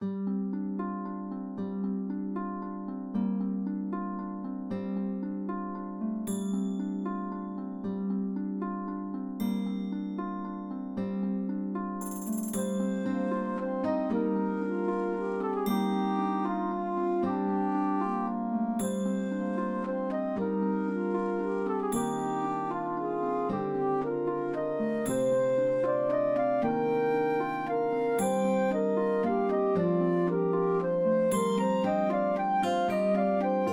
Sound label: town bgm